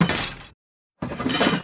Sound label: hatch